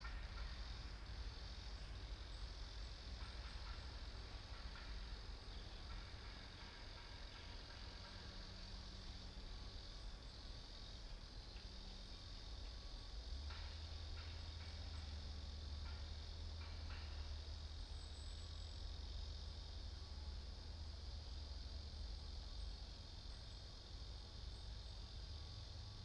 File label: yamanakako sound